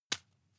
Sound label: juggle catch soft